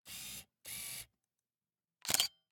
Camera, Pentax KS2, DSLR, electronic, mechanical, focus, out in, snapshot, quick; Eiravaein Works; Vaeyan IV